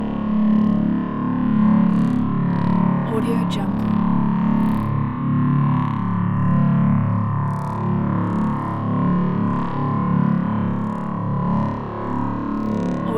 alien game loop ambiance glitchy sci fi soundscapes